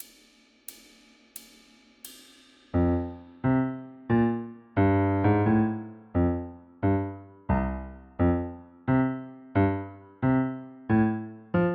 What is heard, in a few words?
Piano